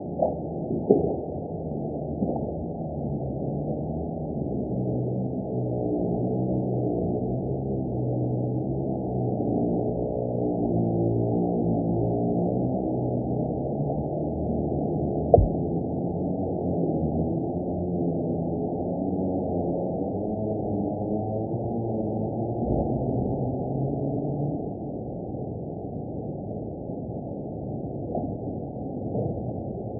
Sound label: song